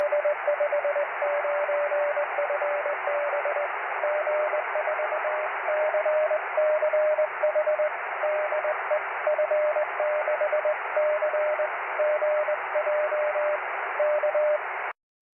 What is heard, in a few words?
cw; 23cm